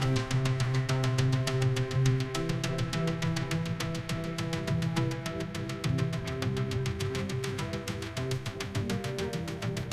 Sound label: tension music; sound effects